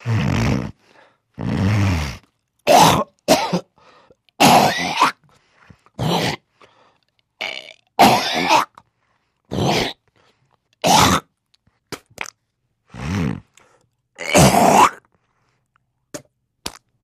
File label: Snort Hawking Spit, coughing & spitting